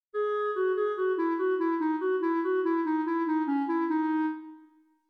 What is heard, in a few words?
fugue